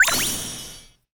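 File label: spark light, impact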